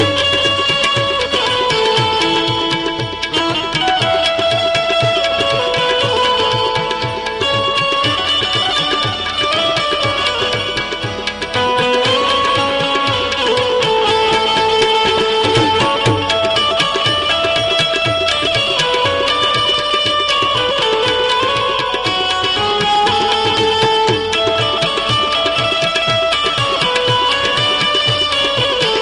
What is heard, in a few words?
music